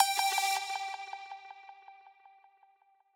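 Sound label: b pluck1, note G octave 5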